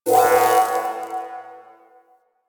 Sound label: Mission UI Open; Zariman